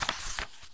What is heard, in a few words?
Book Page Turn; SFX Kit; Foley - Misc